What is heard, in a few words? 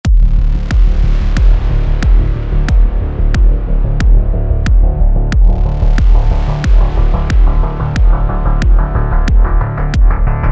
Cyberpunk Rock; sports training energy